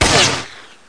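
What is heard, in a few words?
gunshot; Total Chaos Nukem 3d Pack; duke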